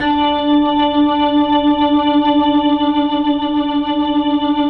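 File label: 3D L, Slow Fast Hammond, Organs; E-MU Producer Series Vol. 5 – 3-D Audio Collection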